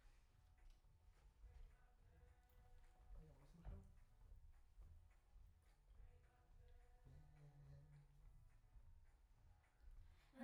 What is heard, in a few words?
Choir Far